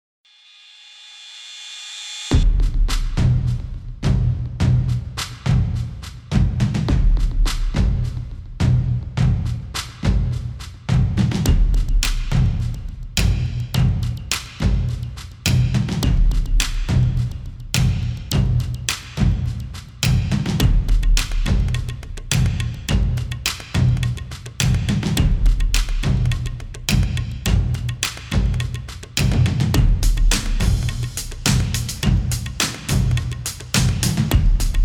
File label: Stock Music